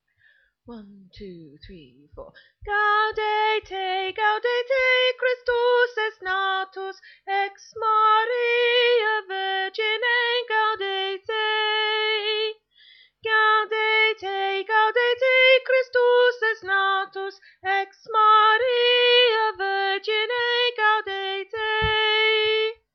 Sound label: Soprano